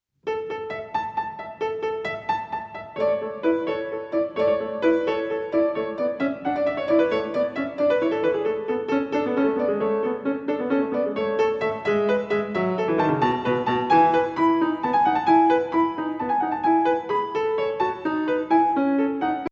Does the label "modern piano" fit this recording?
Yes